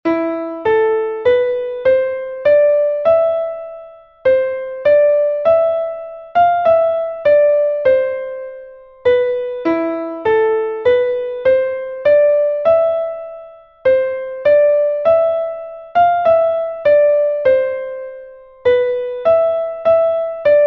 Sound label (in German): klavier; bald faellt von diesen zweigen; melodiemeister